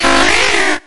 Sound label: shellder